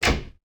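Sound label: door close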